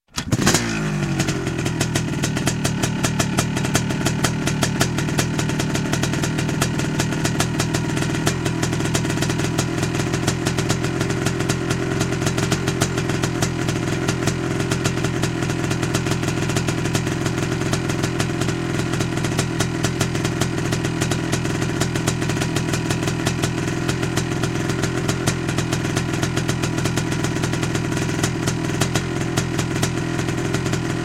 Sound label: Motorcycle, Dirt Bike Start, Idle, Stop; Sound Ideas; Series 2000